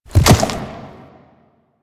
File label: Enemy Horse Stomp; Warframe; Duviri